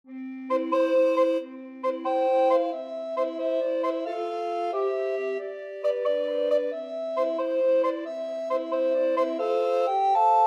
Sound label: for hes a jolly good fellow, recorder quartet